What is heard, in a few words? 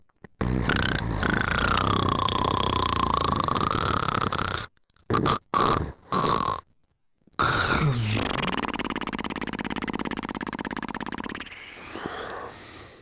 snoring; utalk